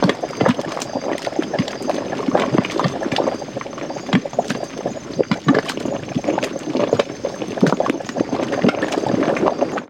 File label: sfx, FX Atmos